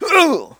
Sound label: gut scream; Rust